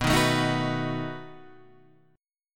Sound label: B 7th B down